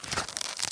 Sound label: OPEN BOOK; PanzerE; Desert